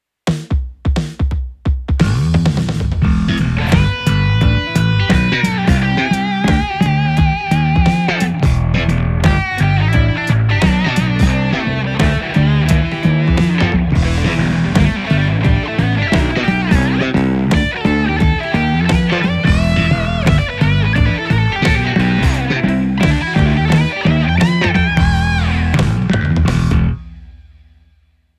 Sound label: Slap Guitars; Reverend Guitars Decision P Midnight Black